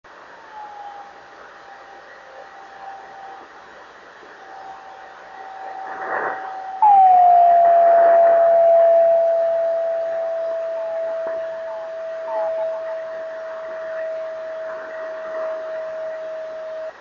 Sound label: double 26930KHz; two signals of the same callsign have been heard simultaneously on the same frequency; driftnet beacons; NAB